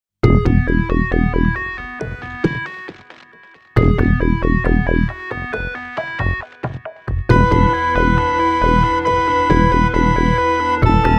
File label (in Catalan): amb flabiol